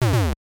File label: POW Hit; Mario Bros.; NES